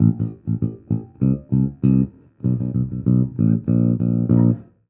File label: Rock, Bass; ACID MUSIC